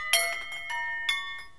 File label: jingles